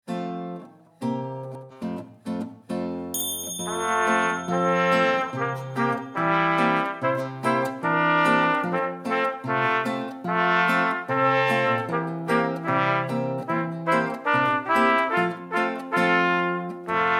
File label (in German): Ennstaler Polka